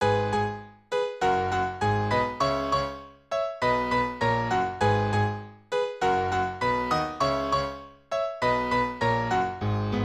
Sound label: Piano Cover